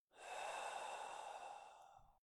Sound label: deep breaths male out; Apotheorasis